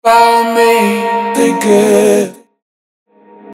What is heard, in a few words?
Vocals Grunge Room